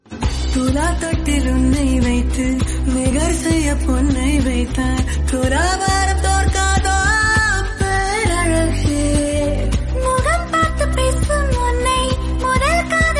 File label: Ringtone